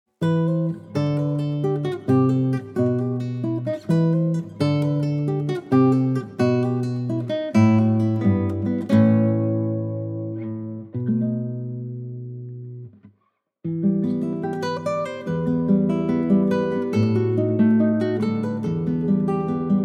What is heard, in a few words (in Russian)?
grustnaya melodiya, guitar